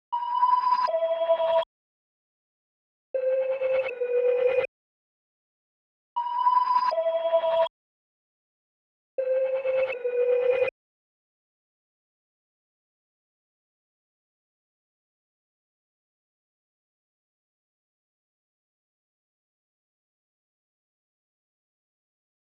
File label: 159 BPM E Min Melody; Reverse; Loop Stems; Cymatics; 2022 Melody Collection - Reverse